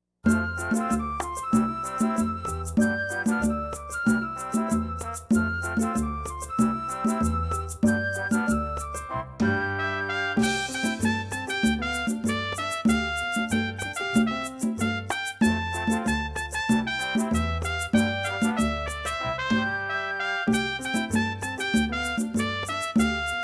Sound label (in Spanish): La Compra (No Vocals)